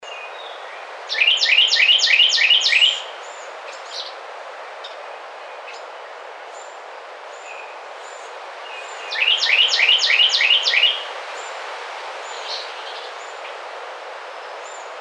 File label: Carolina Wren